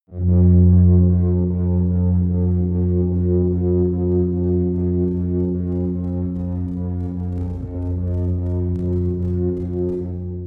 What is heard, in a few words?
synth bass smooth long